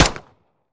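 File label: pistol fire player, weapons; gmod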